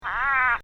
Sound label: scouchii single call, frogs